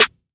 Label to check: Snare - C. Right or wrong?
Right